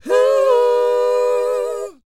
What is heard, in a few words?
WHOA F#, Vocal R&B & Dance; ILIO; Vocal Planet